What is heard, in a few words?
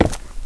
concrete; valve